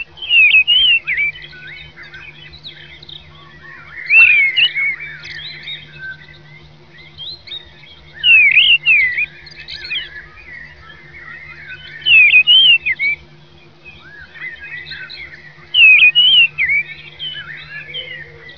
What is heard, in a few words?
mistle